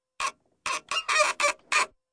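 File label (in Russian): скрип выдергивания гвоздя из старого дерева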